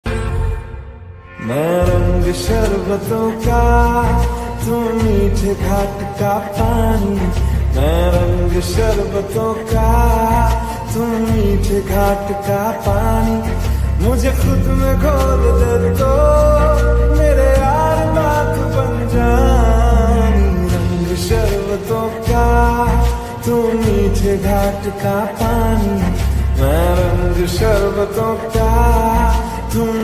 ringtone slowed reverb lofi song ringtone

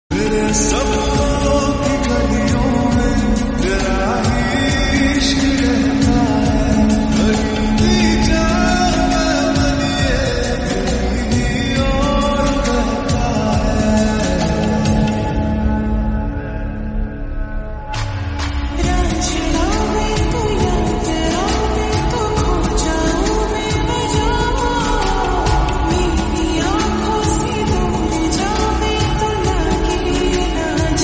slowed reverb ringtone music beats

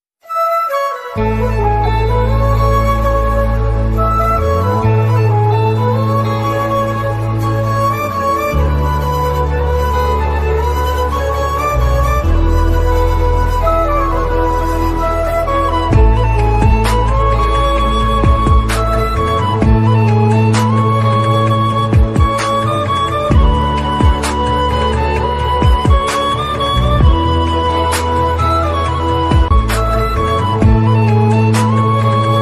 telugu ringtone south ringtones

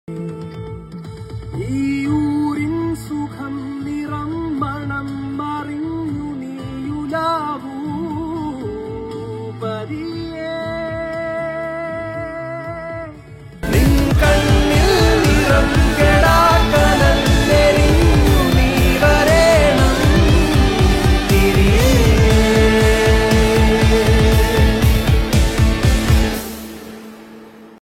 movie song recording

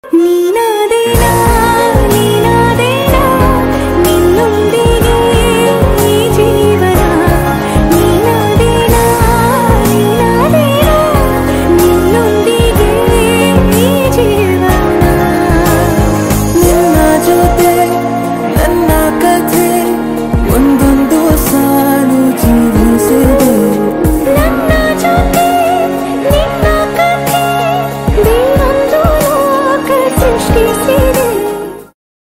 ringtone female version; yuvarathna

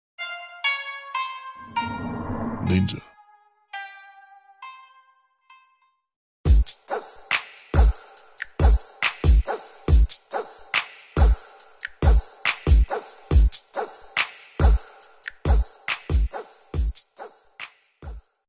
dog ringtone hiphop beats

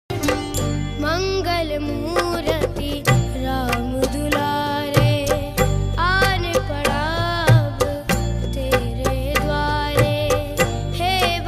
mangal murti ram dulare, hanuman bhakti

